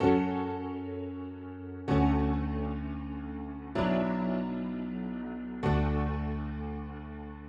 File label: piano